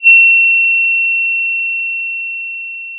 pop, Sound of a school bell

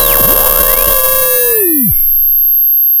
pop, sound of a bike bell

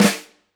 Flam, drum

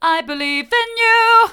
i believe in you hard, vocal

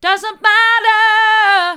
doesn't matter hard, vocal

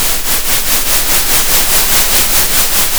center, Sound of a train

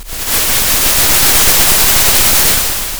pop, Sound of a thunderstorm

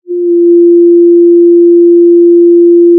pop, Sound of a cruise ship horn

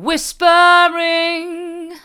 whispering hard

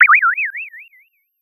Sound of a bird tweeting nicely

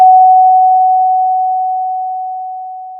Sound of a doorbell

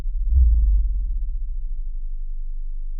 Sound of an owl

